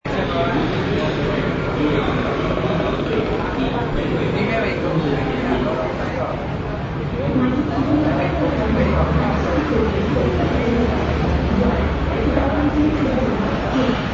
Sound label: miri cafe; borneo